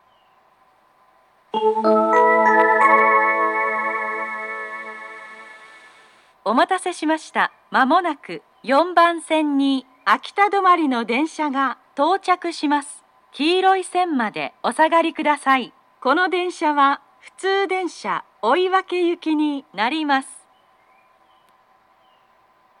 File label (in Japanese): stop local oiwake; jr east; akita; 秋田駅 4番線; 普通; 追分; 普通列車 追分行です。